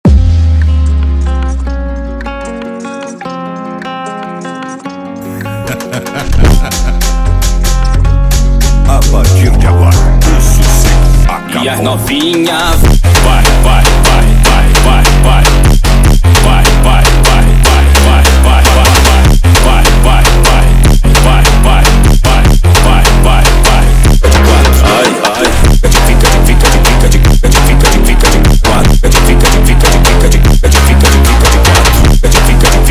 Funk Do Guitar

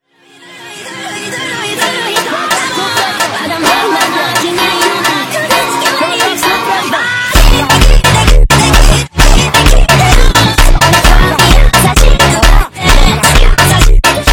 FUNK

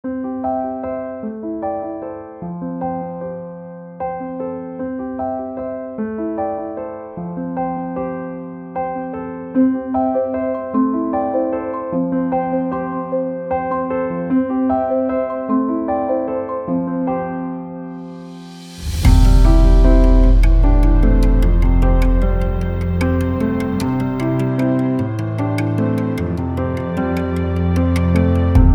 cinematic advertising piano

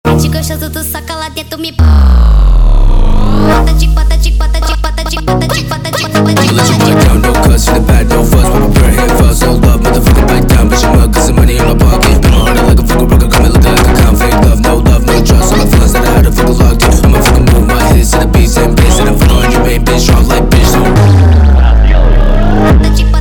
sped Up, funk

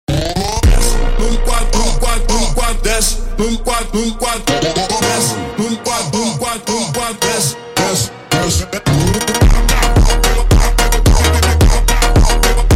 Desce Samba Slowed

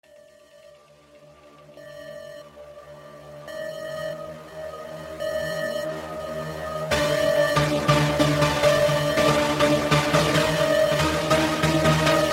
deep flanger echo

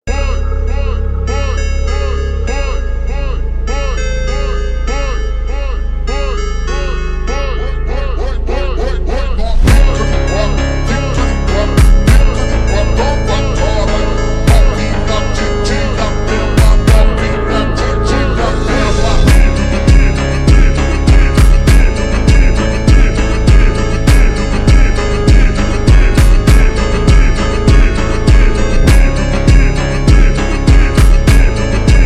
Phonk slowed Reverb

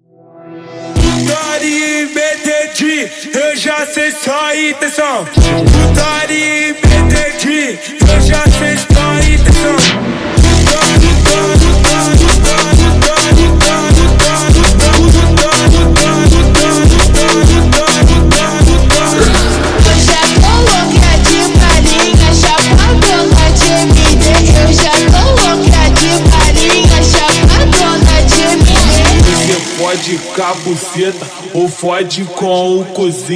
Montagem slowed

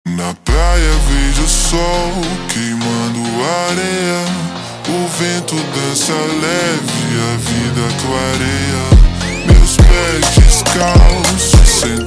Batida Jumpstyle super Slowed

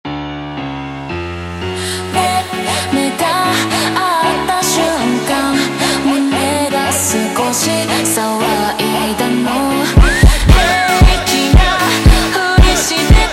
hardstyle Version Slowed

